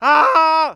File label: fear